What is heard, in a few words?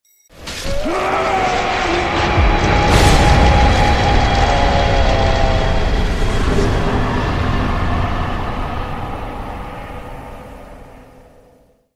Death Cry